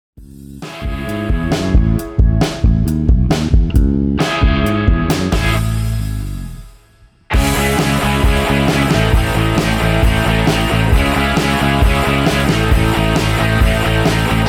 Raindance 134bpm

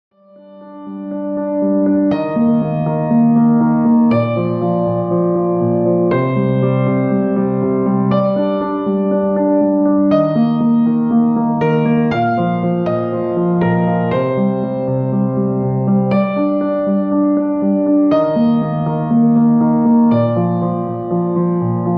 Snow 120bpm

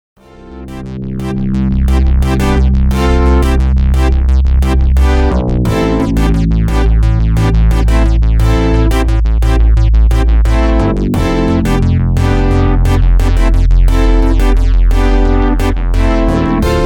California Dream 175bpm

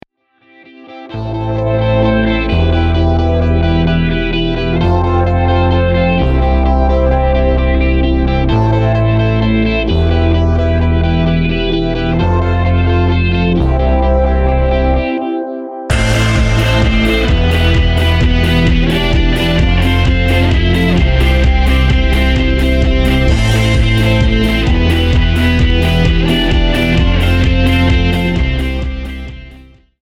130bpm remix

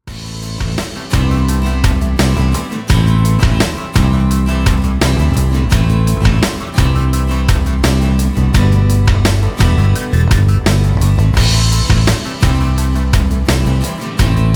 85bpm remix

